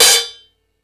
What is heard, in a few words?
Ambient Rock, Drum Machines